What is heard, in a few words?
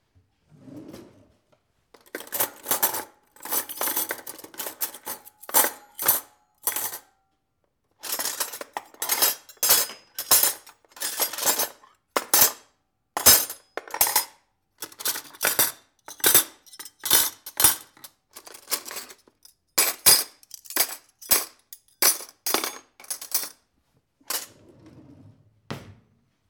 Cutlery Putting